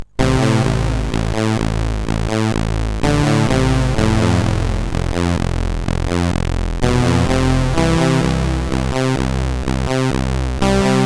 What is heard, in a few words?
sid CSG8580R5; Zeus preview